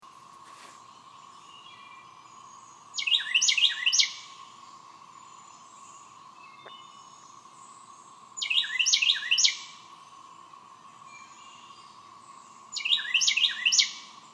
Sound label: carolina wren